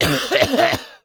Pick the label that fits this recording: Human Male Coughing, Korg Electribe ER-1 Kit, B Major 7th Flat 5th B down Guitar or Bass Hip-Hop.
Human Male Coughing